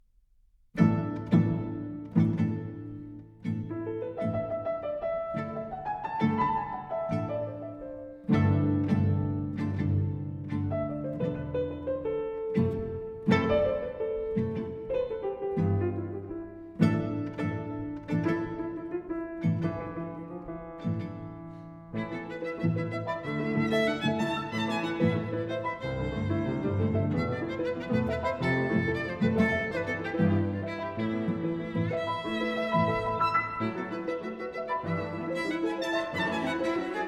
Piano Trio in C Minor; Trio Wanderer; Fauré; Pierné; Op 45

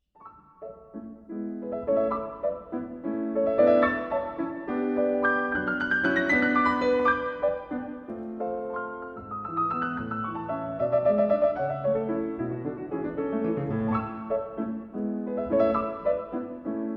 Piano sonata in A Major; Schubert; The Late Piano Sonatas; D 959

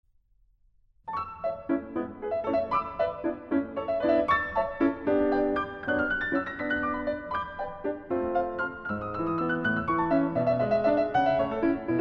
Sonate pour piano in A Major; No. 20; D. 959

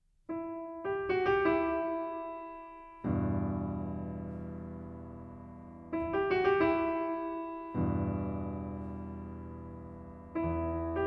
Bayati kurd; Tigranian

